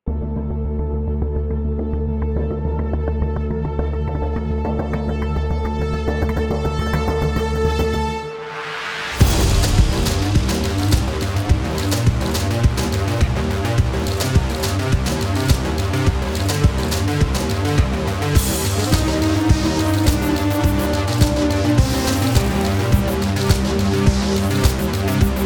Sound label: leads